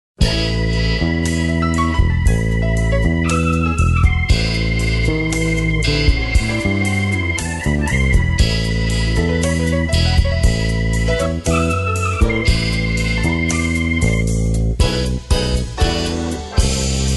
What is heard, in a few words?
live instrumental